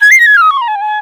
INDIA PICC

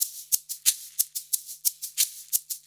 90 SHAKER

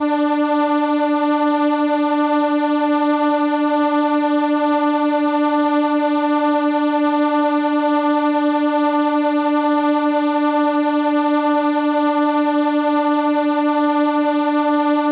Trumpet D5, ARP Solina

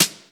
BRUSHES, 12-Bit Drums; E-MU Formula 4000 Series Vol. 1; Hip Hop Nation

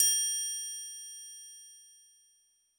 Roland U220 Dry Set; drum machine; 90 s Sample CDs; 300 Drum Machines

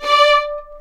D5 VLNS ARCO DB; East West; The Ultimate String Collection

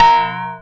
A#, Synclav FM PERCUSSION; Big Fish Audio

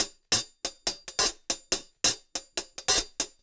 Top Ends; Zero-G; Monster Beats

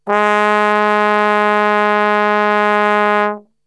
G#1 Brass Super Section; Best Service; TRMBSTRGHT; 90 s Sample CDs

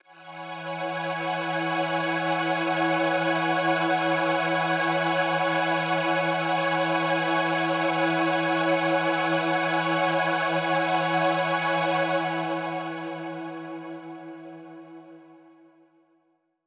Mach String E6:9, synth orchestra

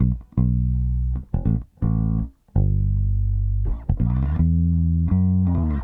Soul Bass, 70s Analog Funk

